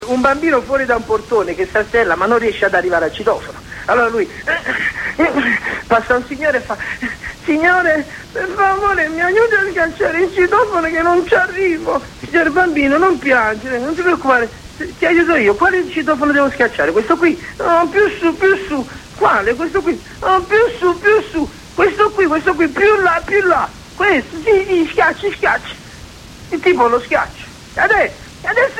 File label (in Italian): campanello; IL CAMPANELLO, un bimbetto troppo basso...